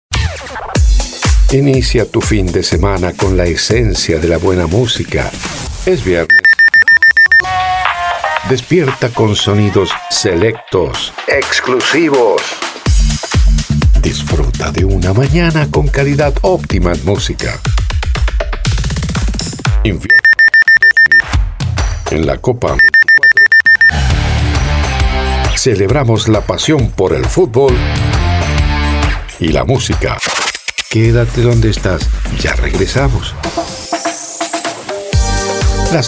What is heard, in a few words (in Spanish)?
Arte Ambient Invierno